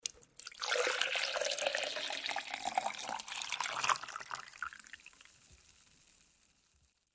water out; Farm

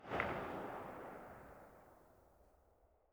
m700 rev, weapons

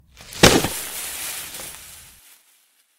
trash

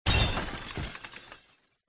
crash, vehicles

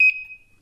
key

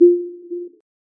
tesla, belt, Speedo

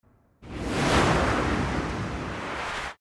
logo hexa

